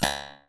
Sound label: Synthetic Doink